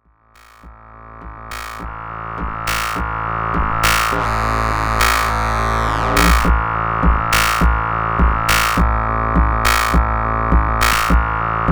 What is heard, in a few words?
Leploop V3 Loop